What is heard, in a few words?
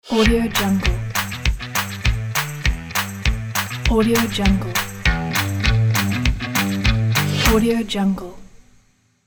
riff guitar logo